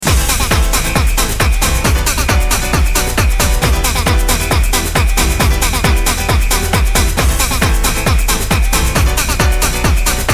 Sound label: club samba